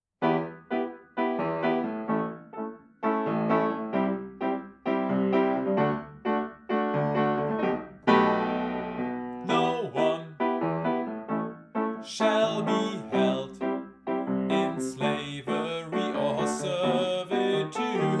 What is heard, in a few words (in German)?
Klavier; sing human rights article04